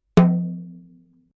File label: Wire Off